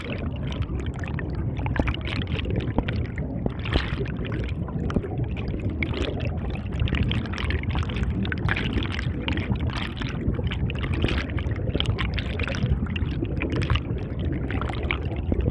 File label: deep underwater mountain river; UltimateFishing2020